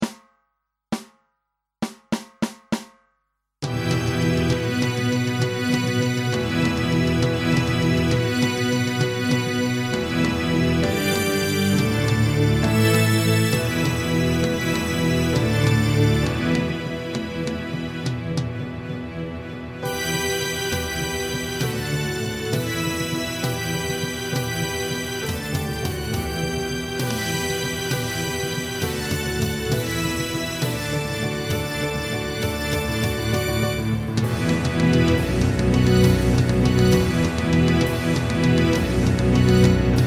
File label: pirates, Viola